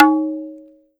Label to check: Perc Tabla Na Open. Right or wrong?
Right